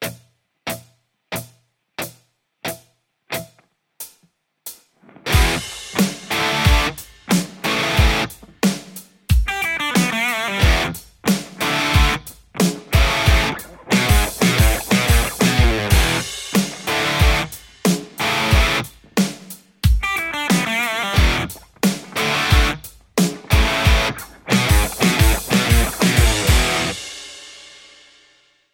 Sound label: tele emissary; igniteamps